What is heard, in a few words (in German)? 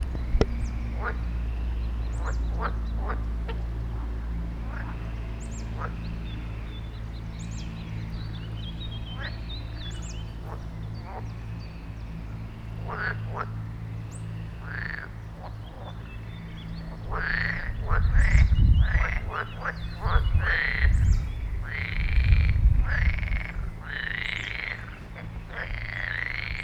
Froschwettgesang